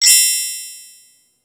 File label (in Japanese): キラリーン♪